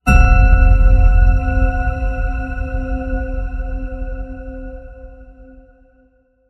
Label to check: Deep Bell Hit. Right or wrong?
Right